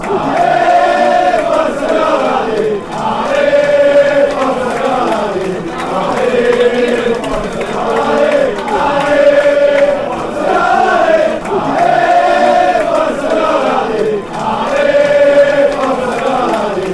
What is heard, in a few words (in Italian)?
ale forza viola, chant